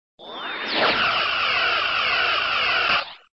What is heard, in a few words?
Sound SFX, flipper